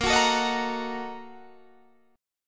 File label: Bb-Major 7th Suspended 4th Sharp 5th, Guitar, Standard; strummed; JGuitar